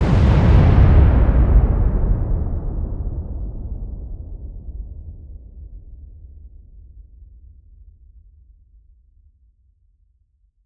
Explosion Demolition Large Deep Blast Dynamite Multiple; Fire and Explosions